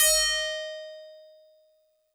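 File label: Tubular Bells DX100 D sharp 1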